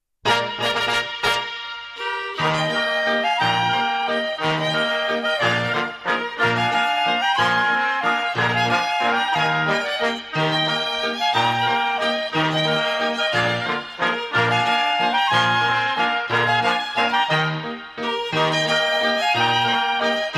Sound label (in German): Tiroler Walz, Autriche